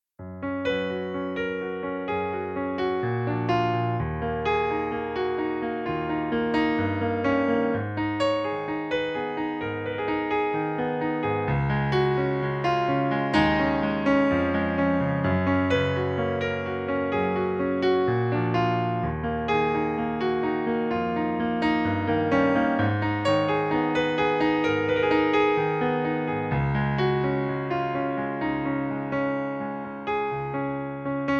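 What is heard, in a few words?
piano playback F# major; F#-Dur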